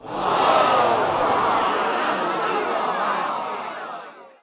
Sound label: crowd sigh